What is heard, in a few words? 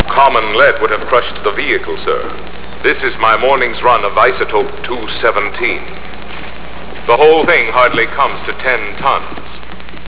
lead